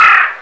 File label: crow kill; de monotonous2